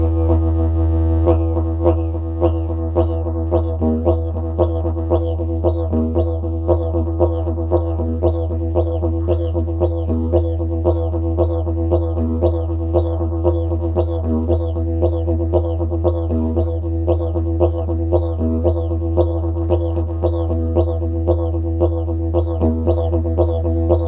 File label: didgeridoo